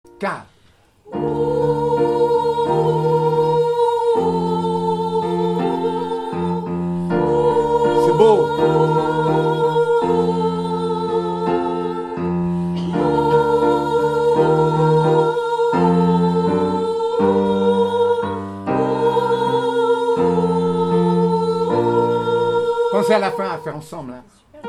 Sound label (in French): OOuh basses